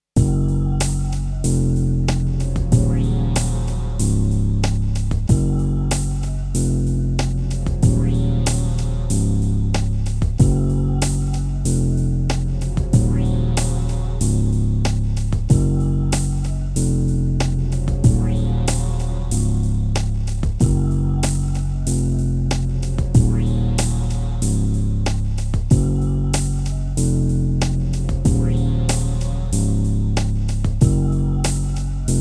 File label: Saturation; Crane Song Interstellar